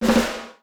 snare End; netent

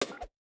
slot reel bounce

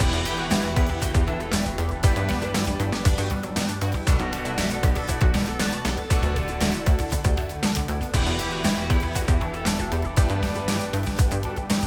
wild Expansion Start; netent; starburst xxxtreme

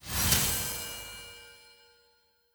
win attention; original finn; netent

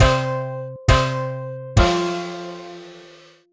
free spin exit; netent

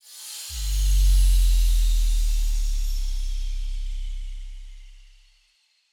free spin music outro

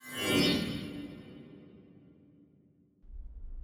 medium win; netent

